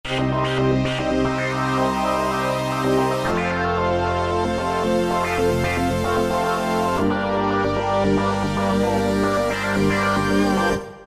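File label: Press Pan Sample Hold, Roland PN-D50-04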